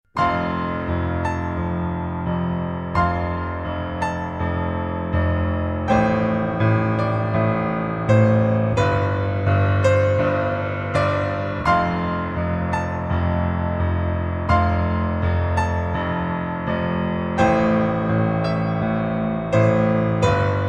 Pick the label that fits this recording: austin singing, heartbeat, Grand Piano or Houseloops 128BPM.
Grand Piano